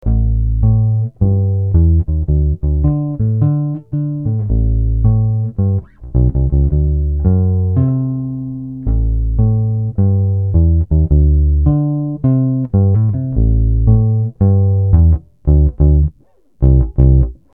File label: harmony H22 bass